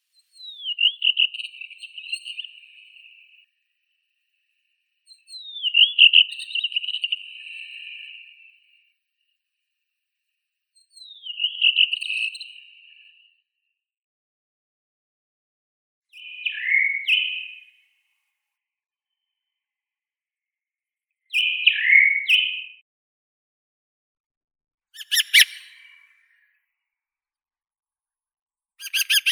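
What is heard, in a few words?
Naumann's thrush turdus naumanni; drozd naumanna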